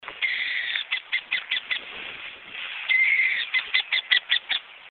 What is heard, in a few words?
Alarm, burrowing owl